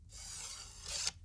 Arrows Out Sweetener; Fairlight Sound Lib

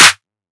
clap oneshot; GhostHack

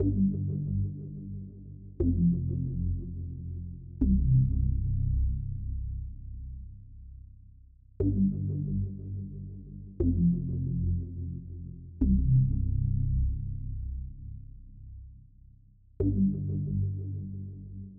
120 BPM G Min Bass, Loop Stems; Melodies; Cymatics; 2022 Melody Mystery Expansion; Unfamiliar

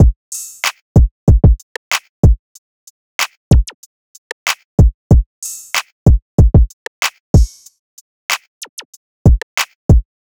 Drum Loop 94 BPM, Hip Hop; Drum Loops; Los Perro; Cymatics

